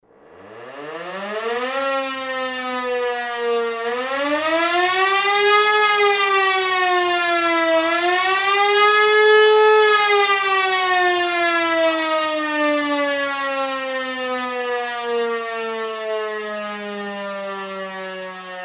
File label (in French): alarme; accident nucleaire